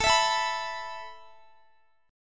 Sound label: A Suspended 2nd A Guitar; Asus2; strummed; x,x,21,21,21,19